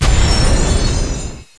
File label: Holy Bolt; warcraft3